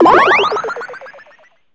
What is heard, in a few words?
SE ENTRY MUSIC UP; maimai